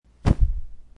poof of smoke

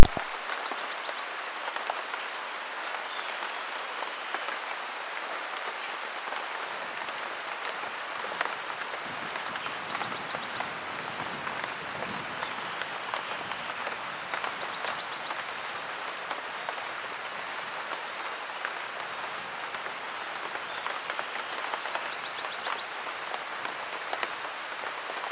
Green Honeycreeper

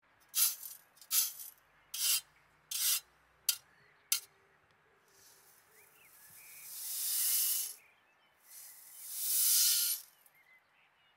Güiro; گوئیرو

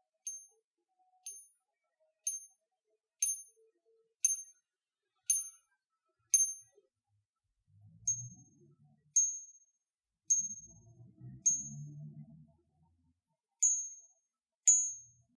Feng Shui ball

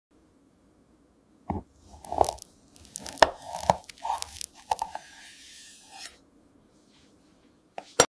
cake cutting

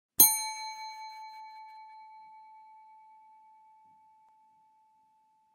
Hotel bell

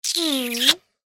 kiss sound effect

